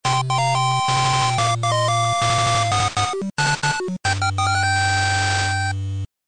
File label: start; rockman7